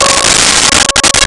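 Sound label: Nylon String Guitar Db6; Nintendo; July 2020 Gigaleak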